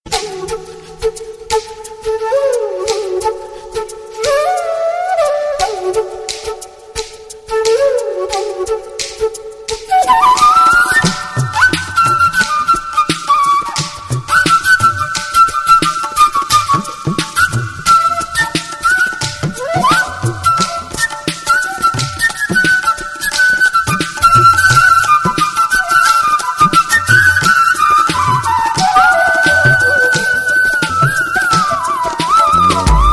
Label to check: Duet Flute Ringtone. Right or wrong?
Right